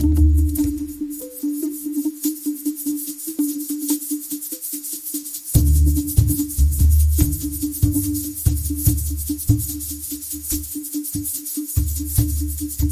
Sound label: background music chill